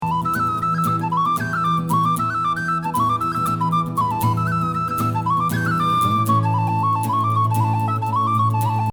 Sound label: Treble Reels @116